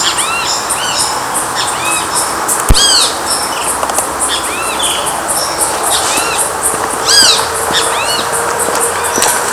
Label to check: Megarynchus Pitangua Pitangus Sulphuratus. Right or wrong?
Right